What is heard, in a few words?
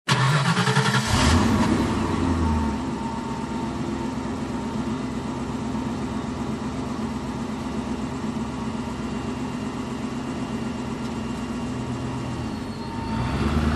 SUV engine startup and drive away